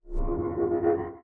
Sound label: Sci-fi Transition